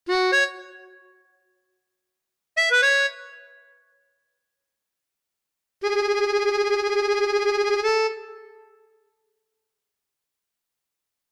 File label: tango, melodica